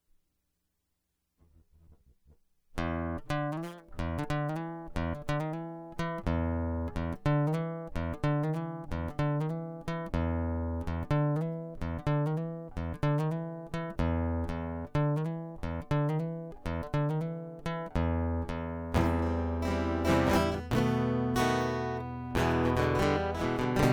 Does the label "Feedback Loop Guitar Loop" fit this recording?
Yes